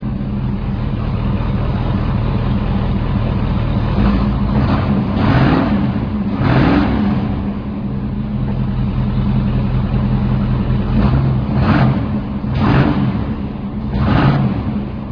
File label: sedan